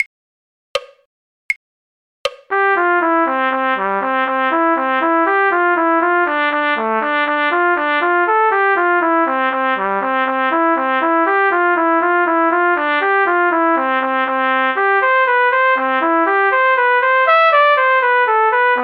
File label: trumpet; The Irish Washerwoman; folk